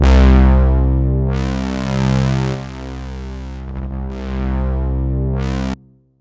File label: Roland JV 2080 JUNGLE BRASS C1